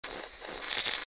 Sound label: Drink; Troll Face Quest Unlucky; Android